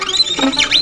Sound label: VIOLIN BIRDS; Best Service; World Colours